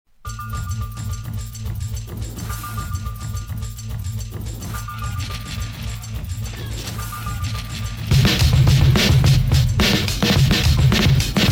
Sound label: drum